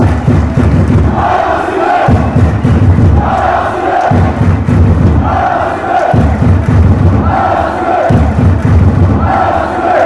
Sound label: allez racing, chants